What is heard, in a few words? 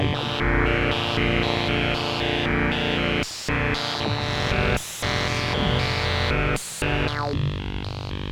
Bb pulse 114